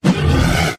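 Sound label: bear dodge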